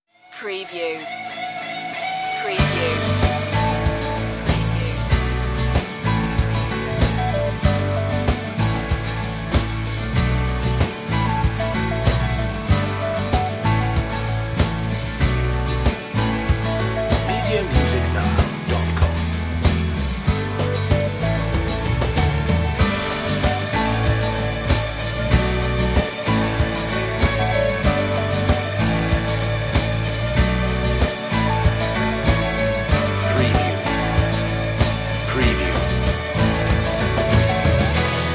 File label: synth version